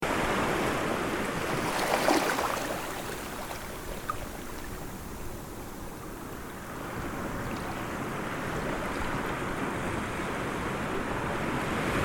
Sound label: mangrove hiss; surf